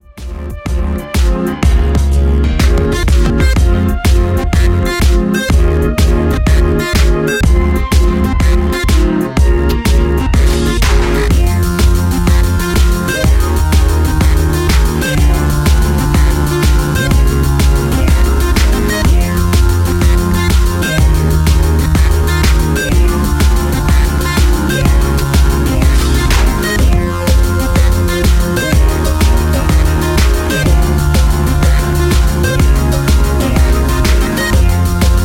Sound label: instrumental